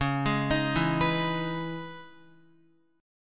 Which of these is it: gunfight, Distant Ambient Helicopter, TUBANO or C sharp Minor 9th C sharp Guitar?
C sharp Minor 9th C sharp Guitar